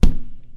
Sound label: Physics Rubber Tire Impact Soft; Counter-Strike: Global Offensive